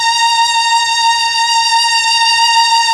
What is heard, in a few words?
STR, STRING